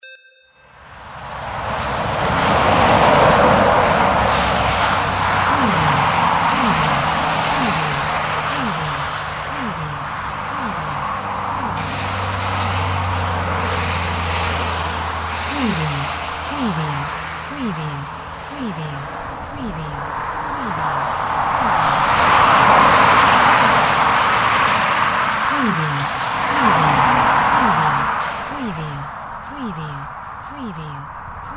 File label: motorway wet stereo; windy beach audio